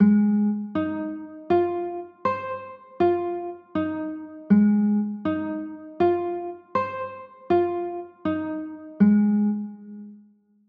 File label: a flat 6